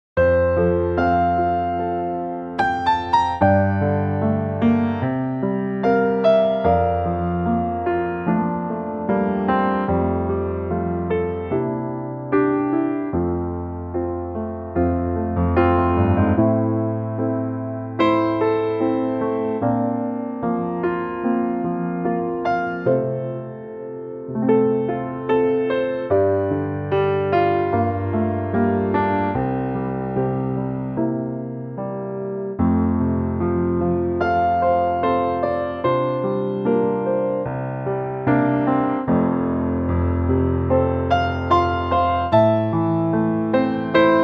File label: F piano; key - F; Pianotrak; piano only arrangement